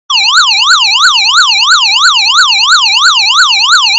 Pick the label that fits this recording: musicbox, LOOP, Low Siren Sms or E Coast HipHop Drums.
musicbox